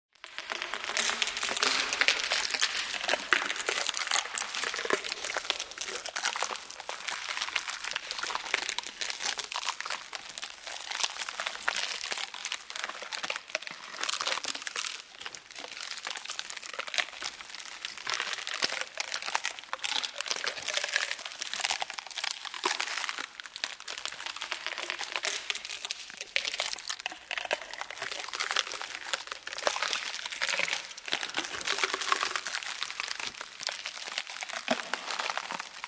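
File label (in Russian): хруст овощей на кухне с эхом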